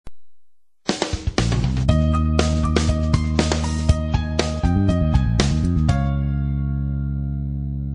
Nhạc nền giới thiệu sản phẩm

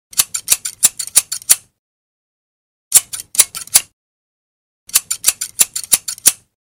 tieng keo cat cat cat cat; trend tiktok

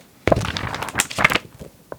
Tieng lat tam bia to giay trang sach rat day

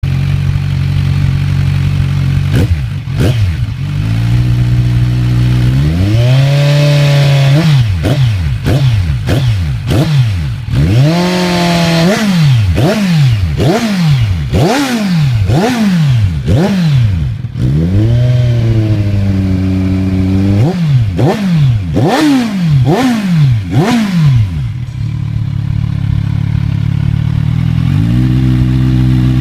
Tieng po xe z1000

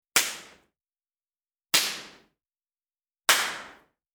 tong hop cac tieng tat 1 tat vao mat

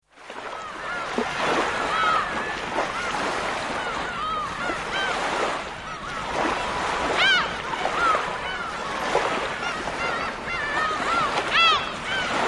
Tieng song bien va tieng hai au keu

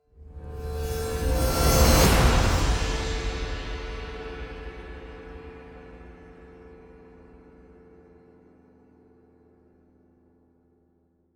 am thanh co nguy hiem chuyen khong hay sap xay ra

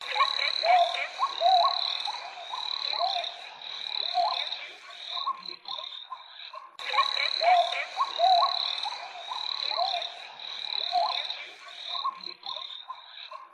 nhac nen yeu du lich; tiktok

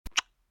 Am thanh hon nhe nhang 1 tieng

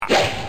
Tiếng bắn cung tên

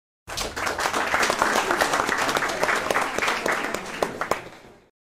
Tieng vo tay trong cuoc hop

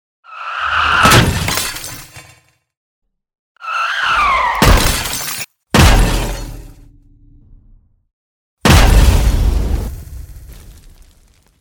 Am thanh tai nan giao thong

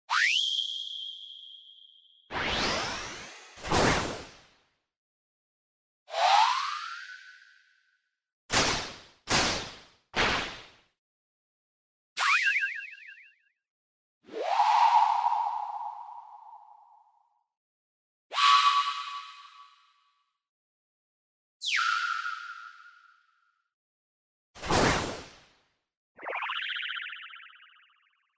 Am thanh chuyen dong cua ninja

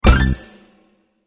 tieng trong joke

